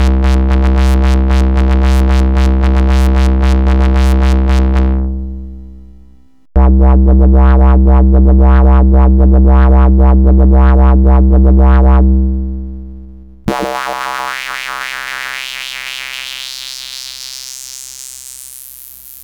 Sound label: filter, midibox sid; new filter driver of software engine v1.5 which improves the quality of the 8580